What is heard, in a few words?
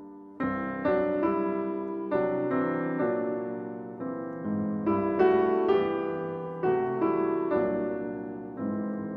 Piano Sonata; Viktor Ullmann